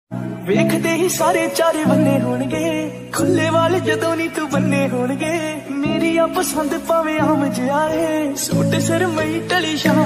new punjabi ringtone new punjabi song ringtone punjabi ringtone